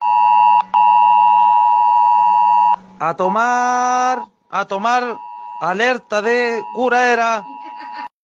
alerta

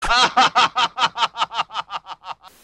risa chistosa

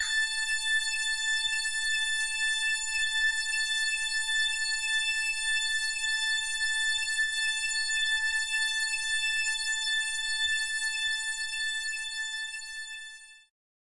zumbido

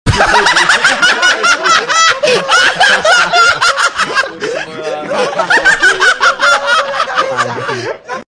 risa desquiciada

